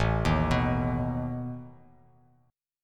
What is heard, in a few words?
A Suspended 2nd Flat 5th, Bass Standard; Asus2b5; arpeggiated; x,0,1,4; JGuitar